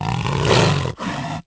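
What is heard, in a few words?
scream; The Elder Scrolls III Morrowind GOTY; XBOX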